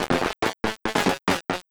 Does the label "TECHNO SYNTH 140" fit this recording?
Yes